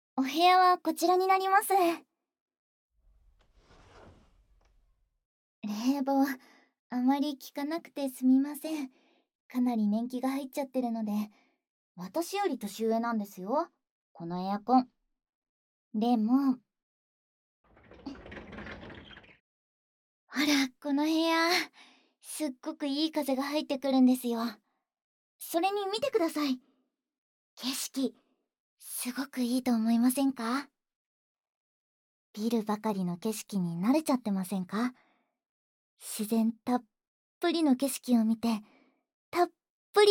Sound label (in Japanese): 耳かき, ASMR; 若女将によるウェルカム耳かきサービス